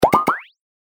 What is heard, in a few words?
policecar win popout; Beat the Bobbies 2